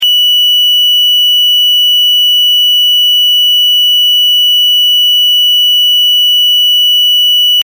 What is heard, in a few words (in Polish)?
ton